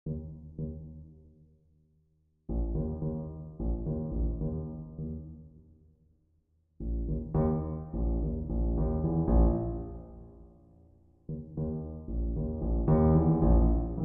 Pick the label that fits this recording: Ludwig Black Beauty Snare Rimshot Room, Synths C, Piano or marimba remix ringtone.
Piano